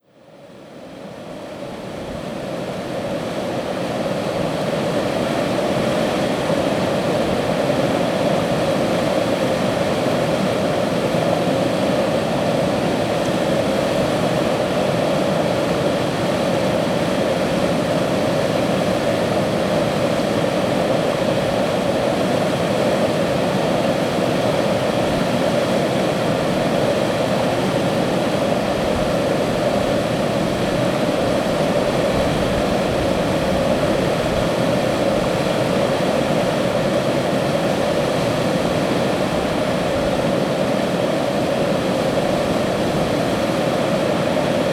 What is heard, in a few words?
White Noise Wind Rain